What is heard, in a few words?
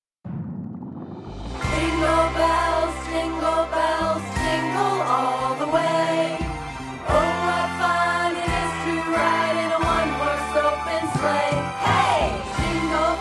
Rehearsal Track